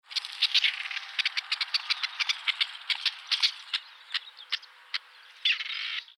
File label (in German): Rebhuhn Rufe